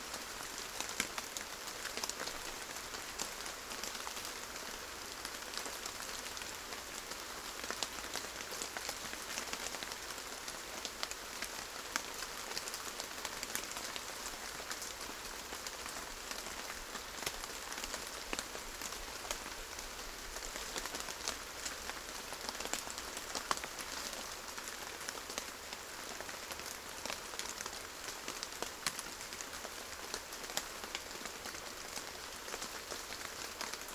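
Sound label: Rain moderate leaf drips; boom library; Thunder And Rain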